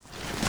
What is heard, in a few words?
Luggage Open